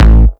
EDM; Warframe; Warframes; Bard; Bard Instrument Packs

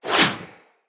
Fire Arrow Whiz By; Level Events; Midrath; Warsong Prologue

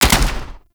SMG Alt Shot; Warframe; Tenno; Sundial SMG; Weapons

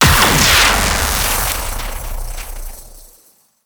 Electrify Cable Impact; Warframe; Teralyst; Ropalolyst

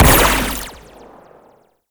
Laser Fire; Amalgam Carrus; Abilities

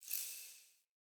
Fey Ward Healing Totem Small Flower

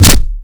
Generic Ability Impact; Warframe; Warframes

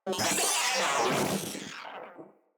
Moa Vocal Death

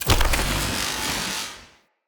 Cannon Tesla Equip Charge; Weapons; Player

